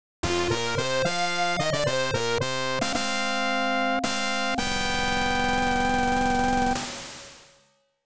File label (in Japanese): ファンファーレ; Nobunaga's Ambition Tenshouki; PC-98; 披露・茶会